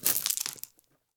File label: sml rock rustle; 3 Leaf Productions - Audio Pack; rock-wood sfx(mini pack)